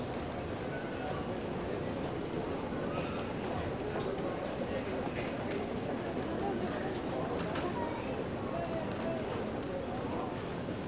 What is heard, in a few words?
hallway, ambiances; CosmicRage